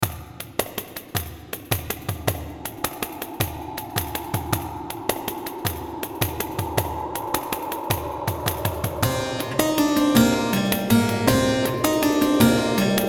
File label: Above Ground Ruins; Dungeon of Elements